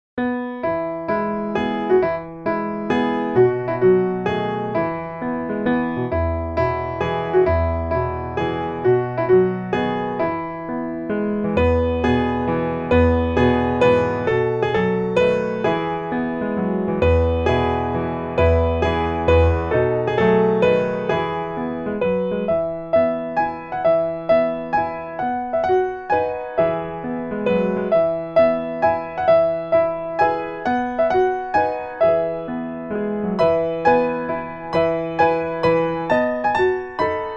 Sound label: piano